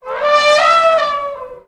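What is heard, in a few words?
Elephant Single Trumpet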